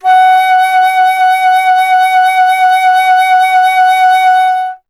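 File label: flute F#; Miroslav Vitous - Solo Instruments VOL-2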